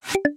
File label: Snap Back Incorrect